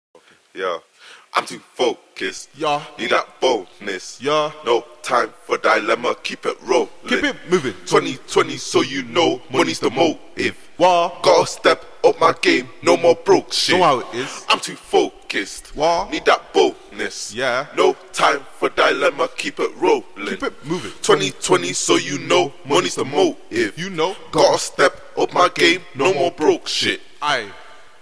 trap, 146, Gbm, Acapellas

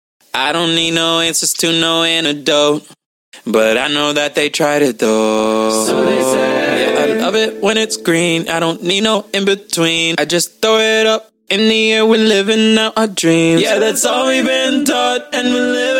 trap, 140, Am, acapellas

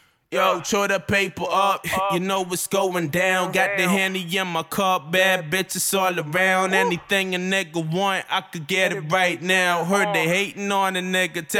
HH 150 GM

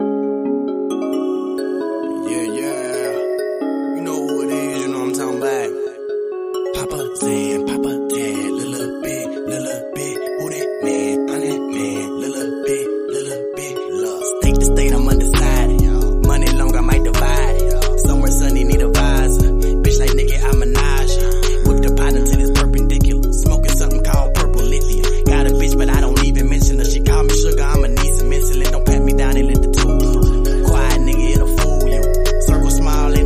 Remix, hip hop/rap

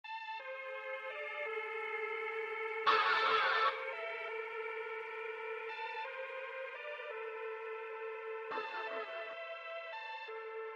Remix

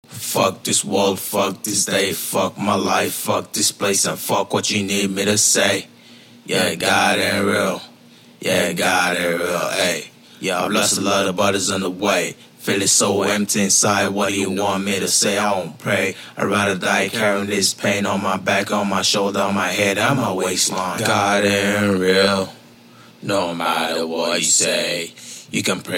hardstyle, 93, Abm, Acapellas; God Aint Real

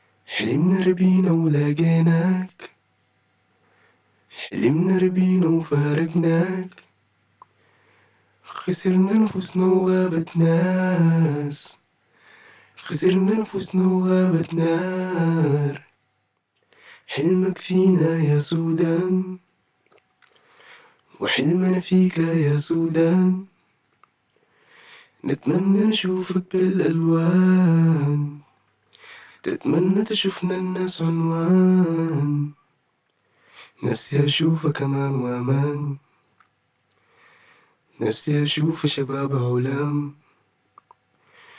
Pop RnB DM 115, Acapella

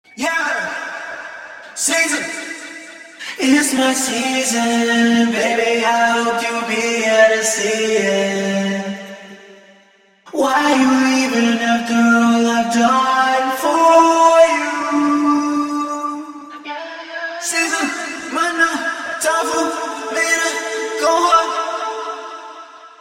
pop rnb 138 Dm

